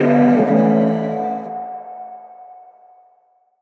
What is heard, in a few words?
aoe; smite